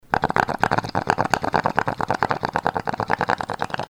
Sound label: bong